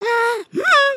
Shrug; emotes

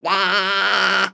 Ribbit Frog Blah Sing; creatures organic

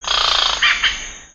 Bird Amazon One shots; Lego; ambience

